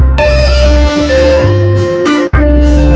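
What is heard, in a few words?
accompaniment instrumental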